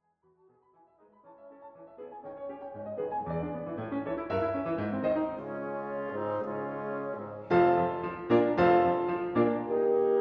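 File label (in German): Bläserquintett; 4.Satz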